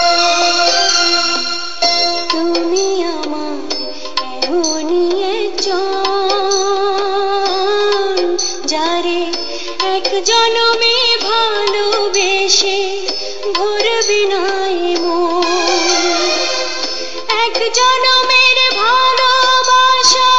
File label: Song Bangla